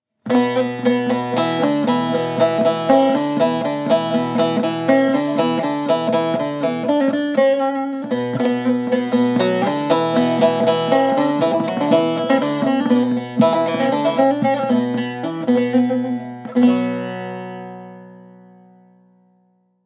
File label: Saz Baglama